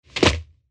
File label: landed on the ground